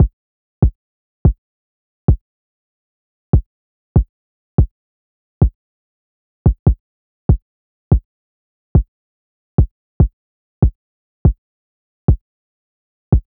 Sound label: Drum Loop 144 BPM Kick, Hip Hop; Drum Loops; Loop Stems; Cymatics; Late Drum Loop